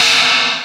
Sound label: TRASHED SN, Drums; Distored Reality 1&2